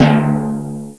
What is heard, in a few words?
Timpani D3; Hexen Demo; Hexen Music; Hexen 6vox